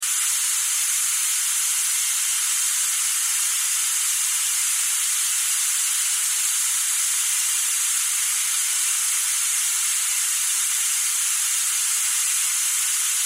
Gas Leak Propane Large Tank Line Natural Air Expel Loop; Machinery; Industry; Blastwave